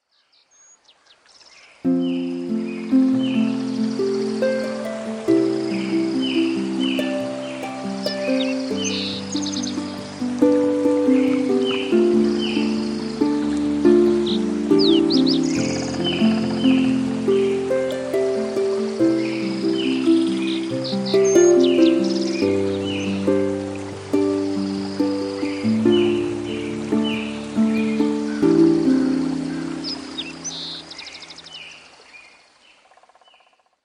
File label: Rivulet, alarms